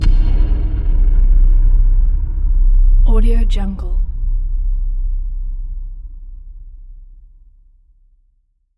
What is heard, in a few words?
braaam strike metallic anvil rumble punch force; esmteam